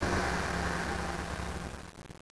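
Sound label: Emergency Brake, Train; Fgc l29; OpenBVE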